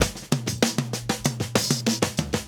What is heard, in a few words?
fill; AMG Gota Yashiki Groove Activator